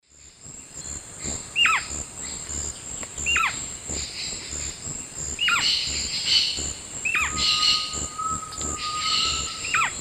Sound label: Neoctantes niger, call; S. America; Eastern Lowlands